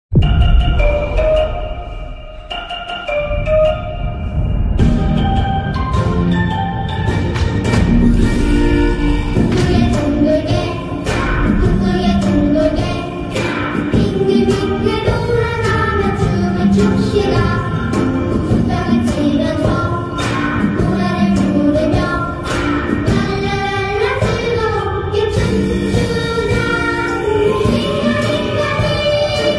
mingle game ringtone; squid game 2

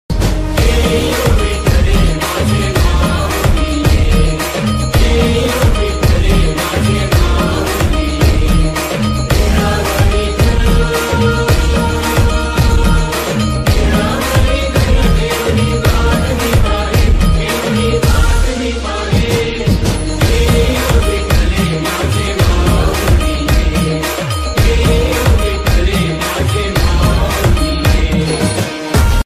marathi ringtone; pandurang vitthal

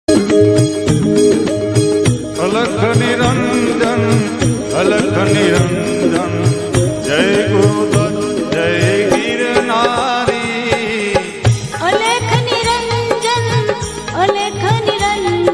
mahadev ringtone shiv ringtone; alakh niranjan

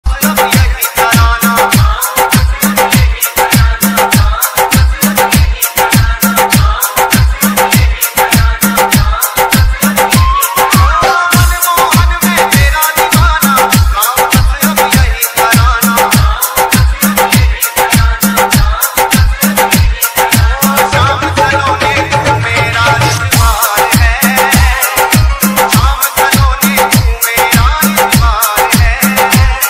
krishna ringtone dj remix

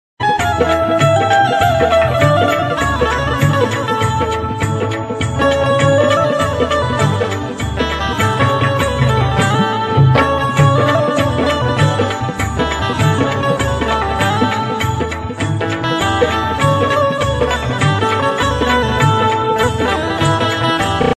instrumental ringtone; Dr Babasaheb Ambedkar; 14april; Bhimjayanti

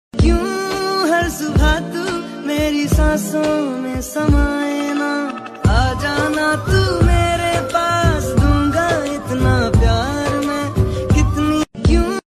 hindi ringtone song romantic ringtone; cute couple ringtone

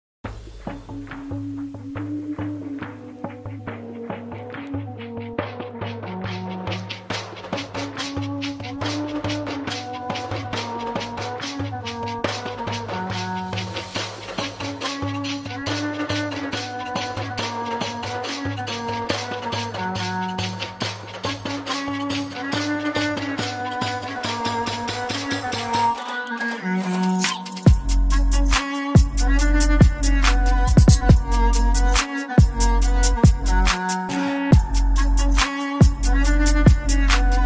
indian bgm beat instrumental ringtone

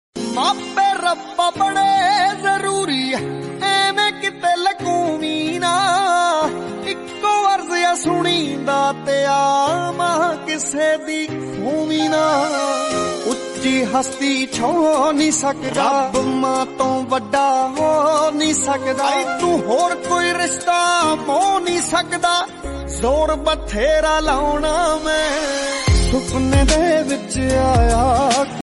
punjabi song ringtone

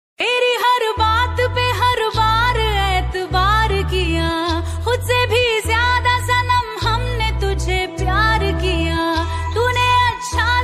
sad song ringtone punjabi song ringtone

